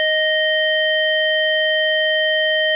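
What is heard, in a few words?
string D#3; fleet keys Samples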